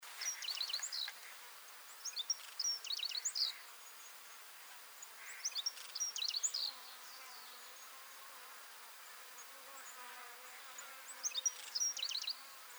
Black throated Sparrow Amphispiza bilineata deserticola